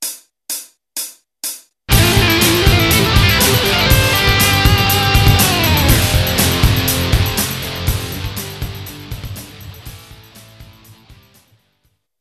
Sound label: tap, guitar